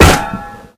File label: damage metal